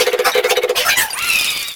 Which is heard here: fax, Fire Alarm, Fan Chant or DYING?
Fan Chant